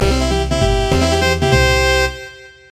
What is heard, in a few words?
Roulette - Win; Clockwork Knight; saturn; Soltian